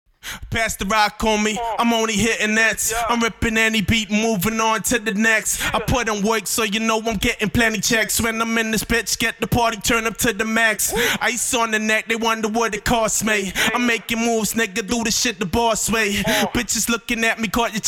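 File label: hip hop 92 B minor, Acapella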